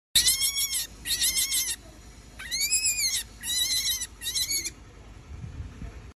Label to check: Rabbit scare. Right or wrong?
Right